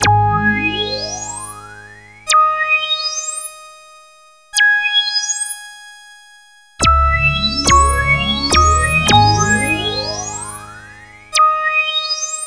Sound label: High Pass Sweep; Sonic Foundry (Sony Creative Software); Club Joints and Street Anthems; Lions Den